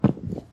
Player Walk footsteps mat Walk

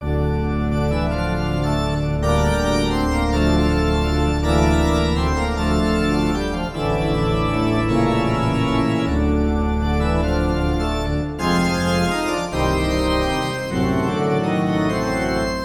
Dark Keys; World of Warcraft; Battle for Azeroth

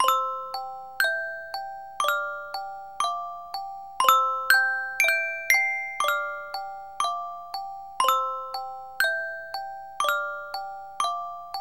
Attic; animal crossing let's go to the city

